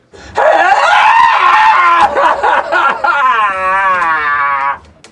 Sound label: aaaaaaaaaaaaaaaaaaaaaaaaaaaaaa; anchorman